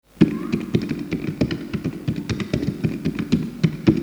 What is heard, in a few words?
3 beats end; gallbladder